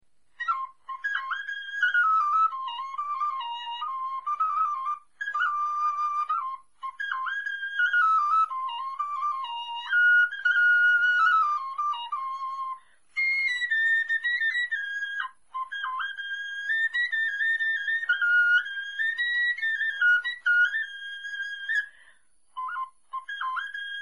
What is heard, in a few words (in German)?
melodie, knochenfloeten